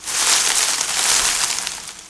leaves

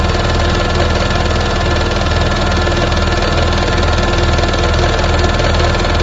projector; cs jackpot v2

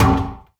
counter secret

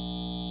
buzz; tabernacle

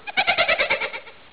boo laugh echo

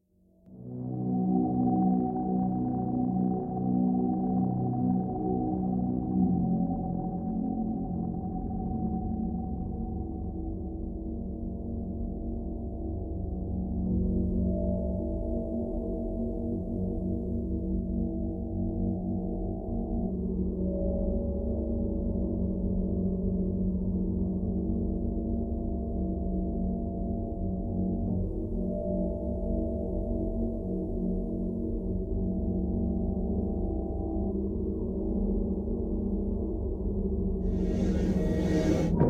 mr ambient; mystic radar

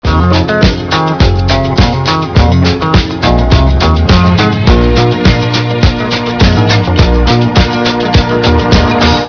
hotel disco

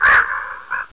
bird

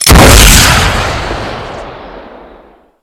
rocket, weapons; svencoop; ww2projekt

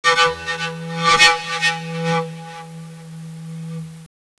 end intro

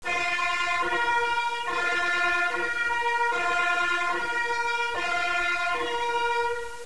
sirene; de tuscan cz

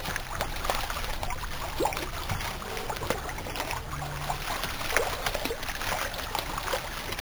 water run; colonial

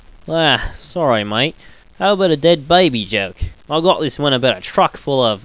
ahh, scientist

